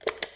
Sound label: Click; isometric-park-fna